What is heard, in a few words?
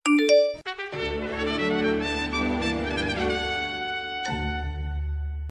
sms; one piece